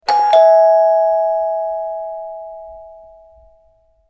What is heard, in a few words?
dingdong